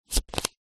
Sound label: leisure playing card turn over on top of deck